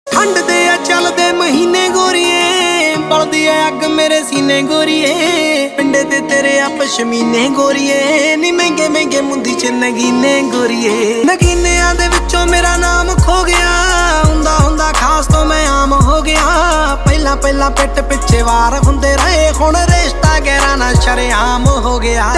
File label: Ringtone